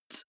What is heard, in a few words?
beatbox hi-hat